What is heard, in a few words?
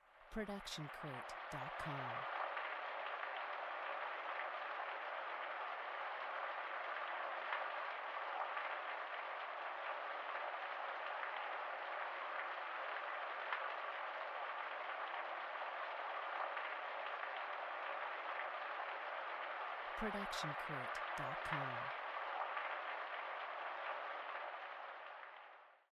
Rain Pouring Indoors; soundscrate